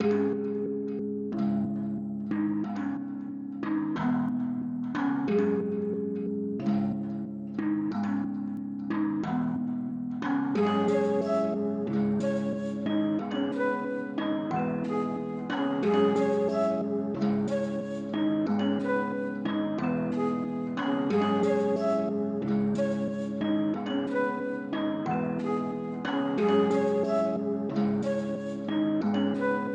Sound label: 182BPM